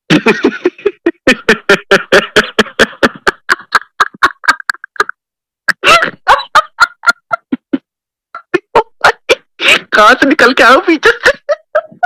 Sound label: manic laugh